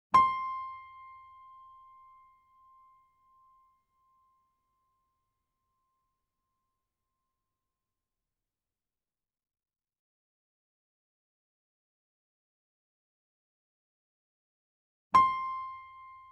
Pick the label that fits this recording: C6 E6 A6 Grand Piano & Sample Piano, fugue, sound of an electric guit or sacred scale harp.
C6 E6 A6 Grand Piano & Sample Piano